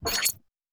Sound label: Device Start, Electric; Sci-Fi Sounds